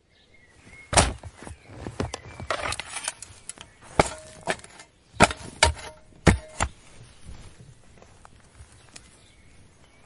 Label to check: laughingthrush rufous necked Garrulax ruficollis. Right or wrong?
Wrong